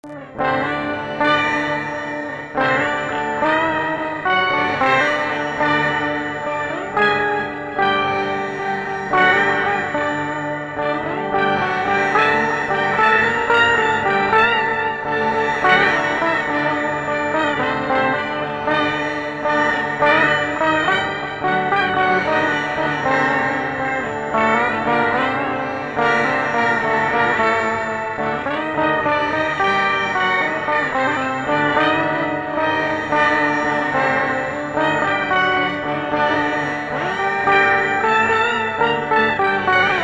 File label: kashmir sitar